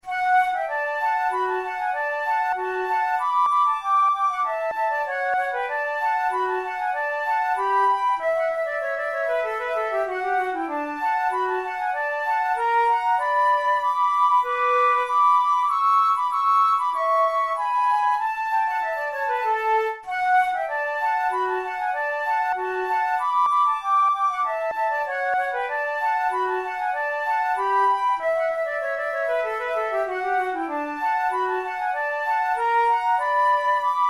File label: boieldieu la dame blanche march duet, flute